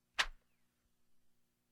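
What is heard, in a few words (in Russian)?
звук полета более слабый; воланчика; бадминтон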